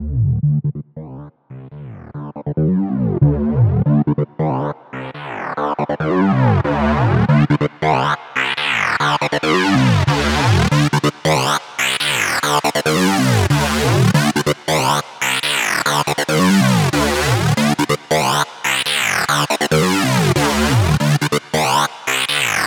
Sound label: Lead, 5 Dm